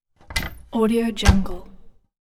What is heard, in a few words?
cupboard door